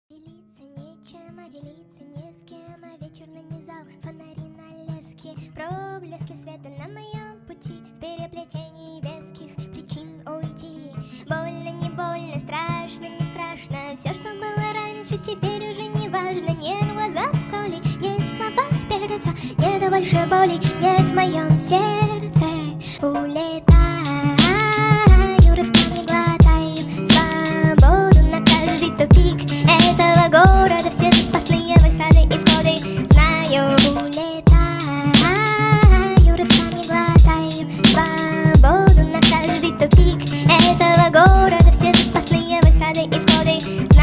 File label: hood beats